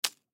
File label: Band-Aid Set Down, Band-Aid Set Down Stack; Foley; Blastwave